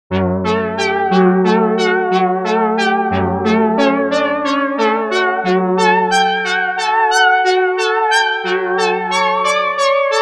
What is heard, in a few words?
Poly Synth; Rerun